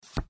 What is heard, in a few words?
flip card